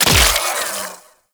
Loot Crate Cyst Burst; Ambience; Gameplay; Warframe; Infested Eris

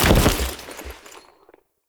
Foley Land; Warframe; Characters; Kahl

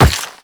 Run Rock, Footsteps; Warframe; The New War; Characters; Kahl

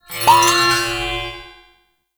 Enemy Movement, Foley; Warframe; Follie Frame

